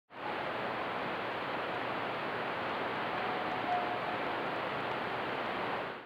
vhf

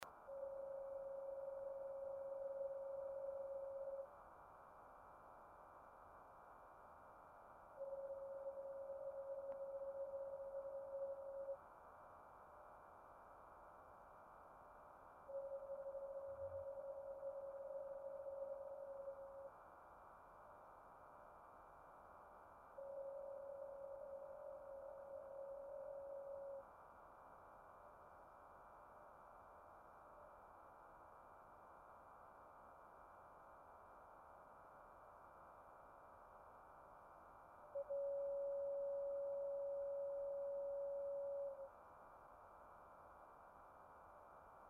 JUNO 28.019MHz x10 speed

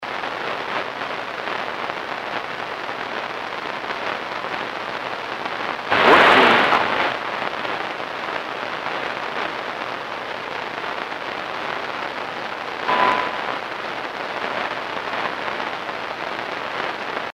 WWV 25MHz

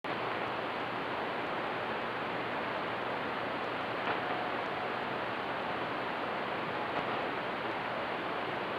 vhf

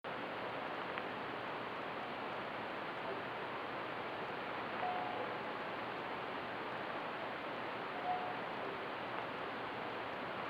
vhf

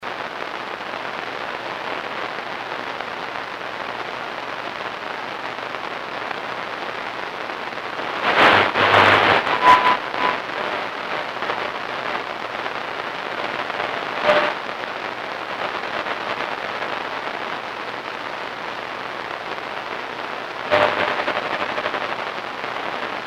WWV 25MHz specimen

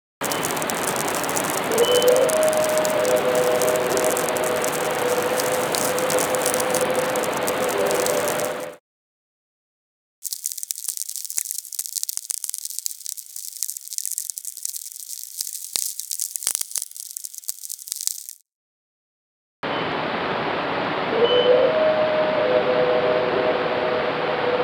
vlf

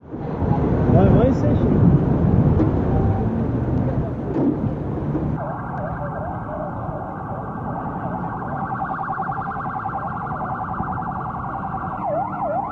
sirens rush to car bomb

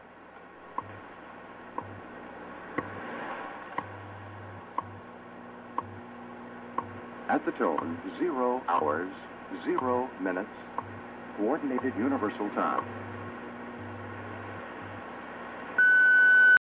Leap Second

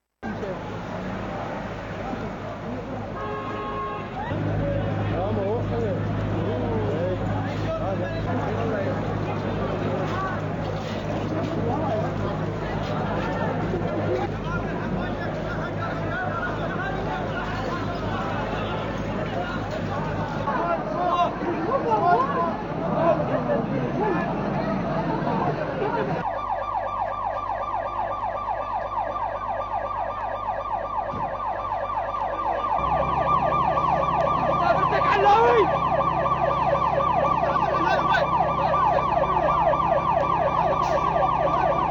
car bomb after siren

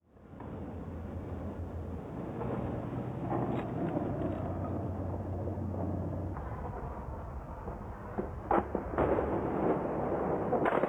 distant fighting

